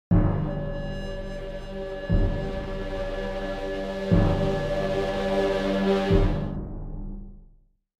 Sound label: Suspense accent horror tension dramatic